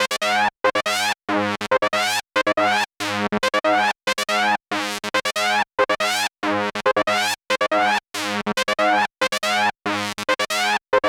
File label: Sol-7 G#; Vengeance Samples